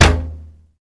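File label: metallic clang of howitzer shell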